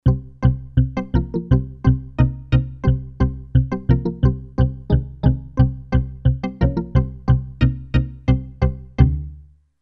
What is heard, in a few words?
Viepluck Bell Decay